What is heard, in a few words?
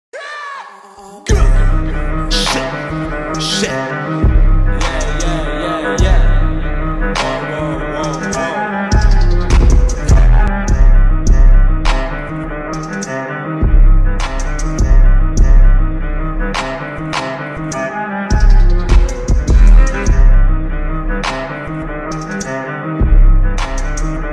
Instrumental